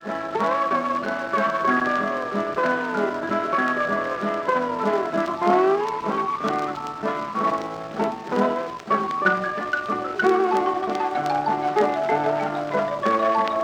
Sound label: Hawaiian waltz; loc-edison